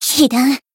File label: damaged, jp